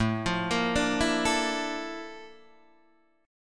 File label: A sharp Major Sharp 11th, A